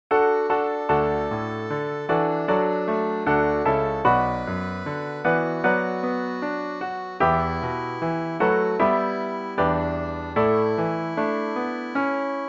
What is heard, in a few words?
piano